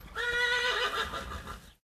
ambient port; World Of Warships; port3d

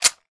gun overheat low

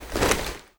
foley gear rattle; Call of Duty Modern Warfare

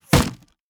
wood bullet impact; Rust

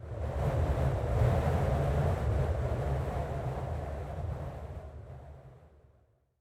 ambient battle; World Of Warships

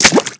bullet water, weapons impact; Call of Duty Modern Warfare

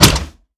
Aircrafts Engines Packard Merlin Prop; DCS

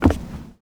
wood walk

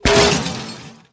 metal lamppost down; World of Tanks Blitz

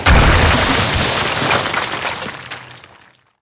concrete crash

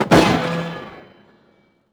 mgsj fire break npc far

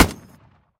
last shot, guns tanks

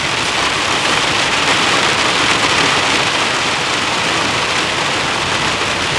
rain car; Call of Duty Modern Warfare

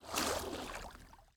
Personnel Footsteps Water; DCS

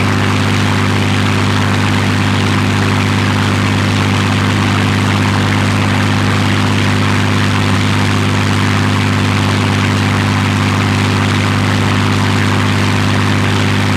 plane; World of Tanks

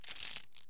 belt use; Escape from Tarkov

